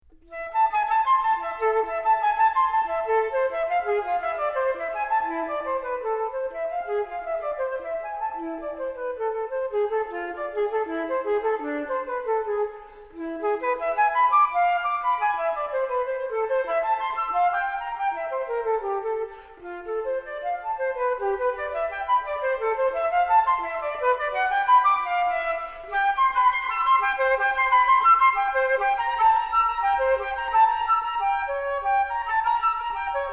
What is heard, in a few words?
Flute Partita in A Minor Allemande; Eric Lamb; BWV 1013